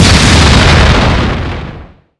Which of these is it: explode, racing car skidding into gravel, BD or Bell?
explode